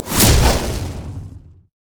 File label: arcane barrage; LoL; ironforge xerath